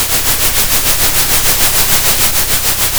center, Sound of a train

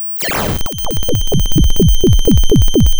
pop

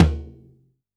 Tom, drum

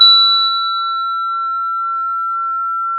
pop, Sound of a school bell

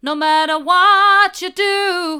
hard, vocal

